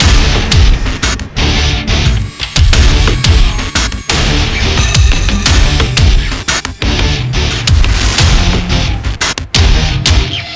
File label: Hammerstrike Fort High Action; modern combat 4 zero hour; android